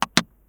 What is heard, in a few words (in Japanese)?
もの置く